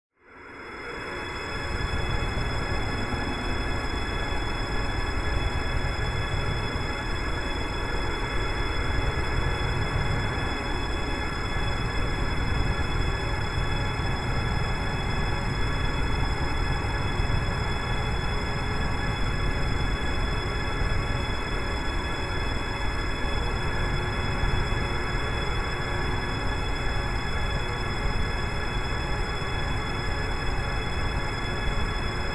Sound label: Drone Musical Pulse Distortion Flange; Musical Sound Design; Blastwave